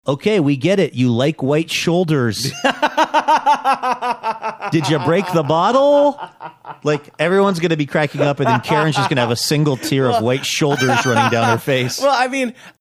wound up laughs

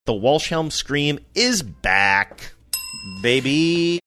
scream is back baby

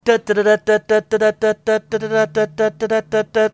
making teletype sounds

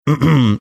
throat clearing

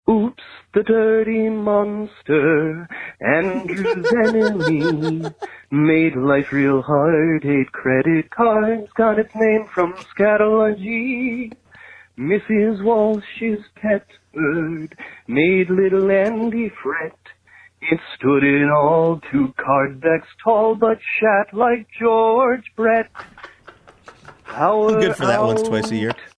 listener singing song oops dirty monster

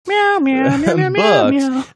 singing

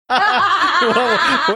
funny laugh

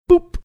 boop

